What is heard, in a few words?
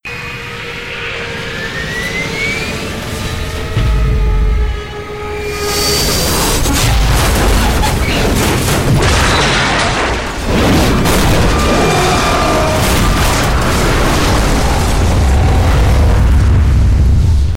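plane crash; cstrike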